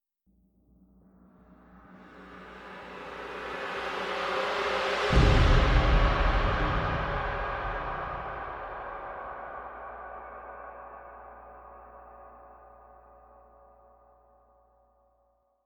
drum; twistedtreeline; lol

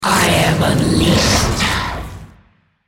Dragon move; Shyvana

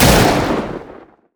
reckless swing; olaf

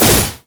grenade; rumble

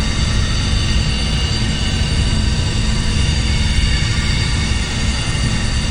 drunken rage damage loop; LoL; gragas

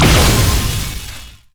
death ray; LoL; viktor skin03

